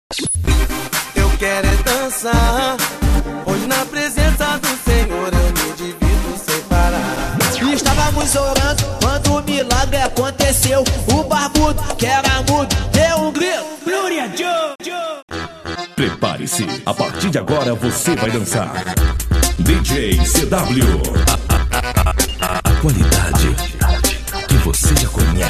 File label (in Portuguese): funk gospel abertura; pista santa vol03